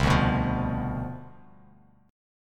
C Major 7th Suspended 2nd Suspended 4th C down, Bass Standard, chord; CM7sus2sus4 strummed; JGuitar